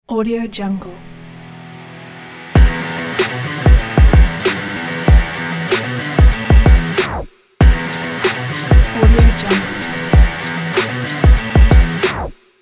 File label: sport rock